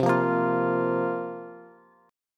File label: C 7th Suspended 4th, Bass; C7sus4 strummed